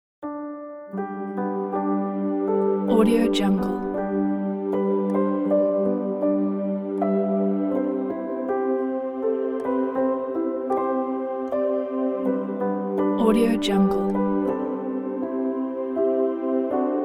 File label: away in a manger with christmas choir